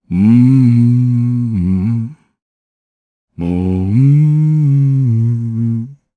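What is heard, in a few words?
Vox Hum, Japanese; kingsraid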